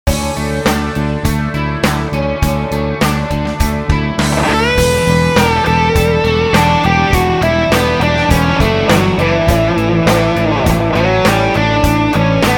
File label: Celestion G12K 100 1x12